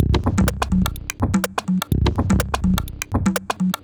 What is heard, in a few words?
Top C 125bpm, House Drumloops